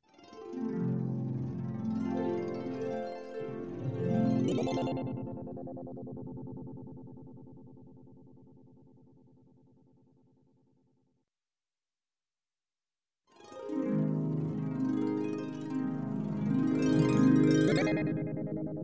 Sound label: Harp arpeggio, tremolo Ending, Music; Sound Ideas 2000 Series